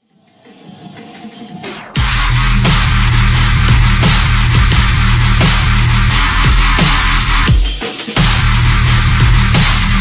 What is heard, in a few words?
drumstep